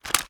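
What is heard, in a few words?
foley rechamber start; weapons; gmod; viper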